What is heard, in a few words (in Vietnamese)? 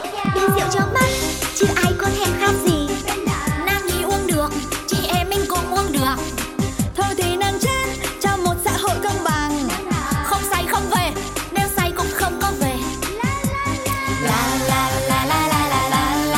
Bai hat